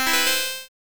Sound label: yay